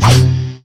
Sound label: crit attack; masteryi skin02